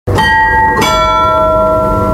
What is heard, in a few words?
clock